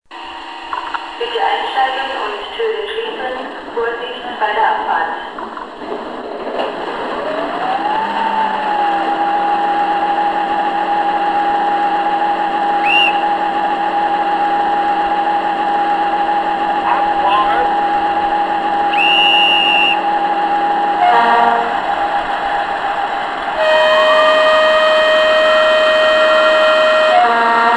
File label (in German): Taigatrommel